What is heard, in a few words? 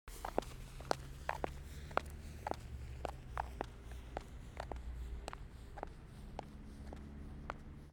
High heels Sidewalk